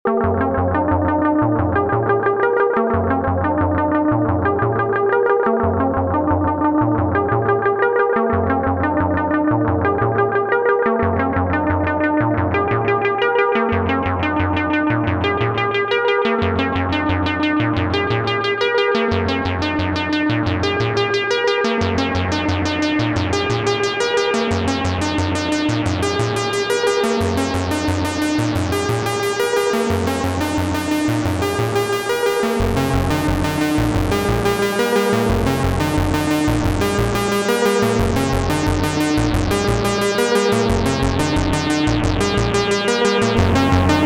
Sequenz; Treadstone

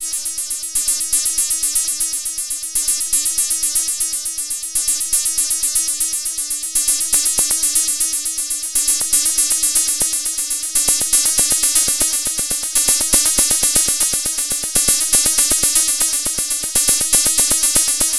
Dreadbox Drips V2 Mixer Uebersteuerung

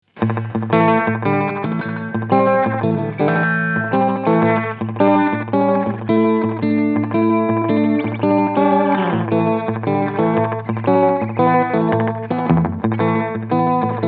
Catalinbread Echorec Head 1 Head 2 kleiner Raum

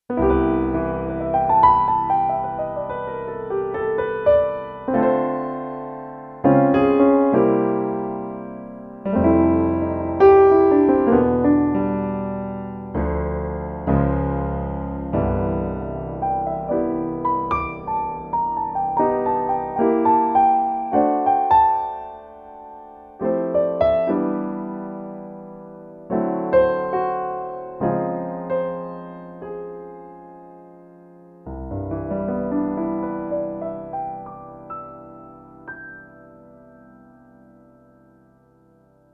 Digitalpiano Line; Miktek

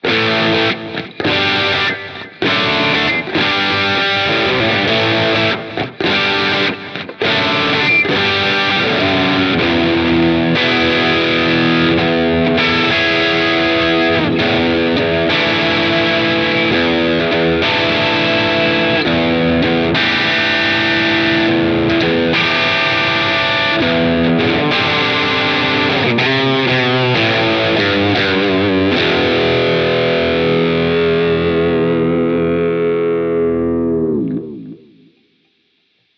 Gitarren Plug ins im Vergleich Bias FX 2 Crunch Rhythm

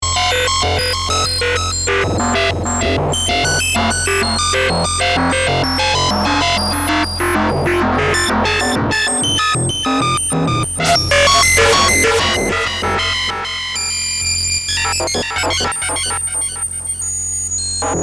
Malekko Manther Laut und Gemein

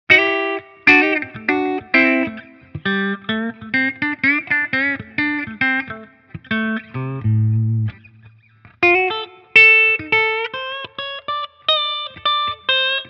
Epiphone Firebird clean Mitte

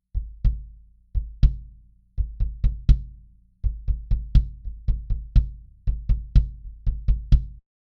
Sib Rocket One Bass Drum Mikrofon

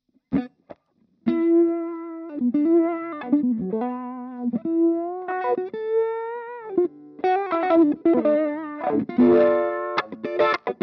Depth auf 0 Bass

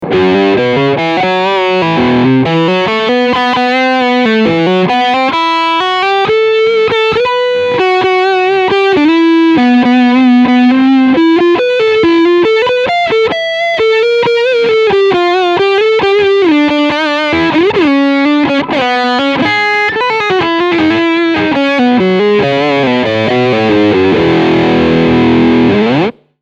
Leadsound Steg PU

